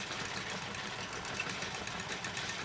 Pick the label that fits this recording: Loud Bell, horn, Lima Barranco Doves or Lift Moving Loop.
Lift Moving Loop